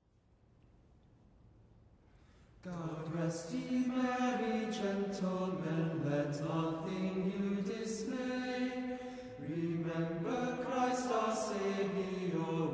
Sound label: choir